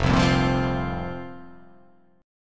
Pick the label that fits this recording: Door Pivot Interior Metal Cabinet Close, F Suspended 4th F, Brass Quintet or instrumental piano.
F Suspended 4th F